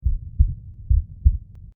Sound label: heart